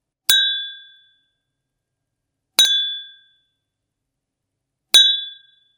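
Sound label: glasses sound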